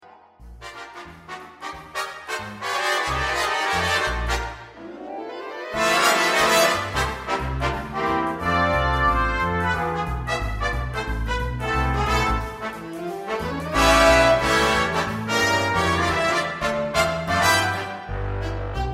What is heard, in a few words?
Happy Dansa, Trumpet